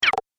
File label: Game Pause, video game